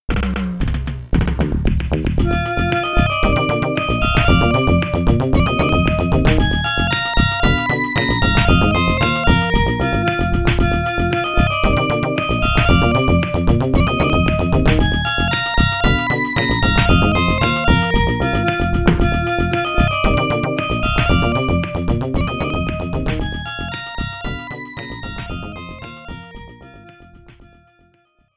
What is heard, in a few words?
Sniper Stage Shot